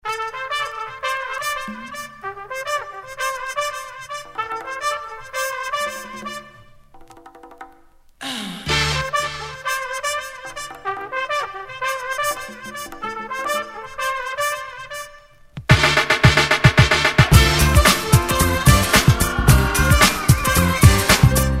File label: Disco